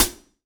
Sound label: Y9 Hat closed; Akai MPC500